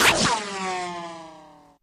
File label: scout jump; video games; source engine; tf